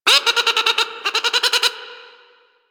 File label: Drifter Camp Bird Close; Warframe; Quests; The New War